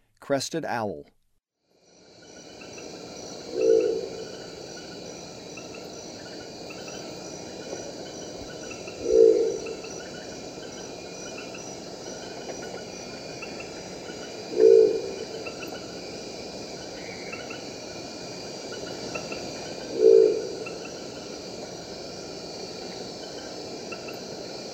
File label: Crested Owl; S. America; Eastern Lowlands